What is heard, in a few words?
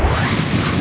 tengu attack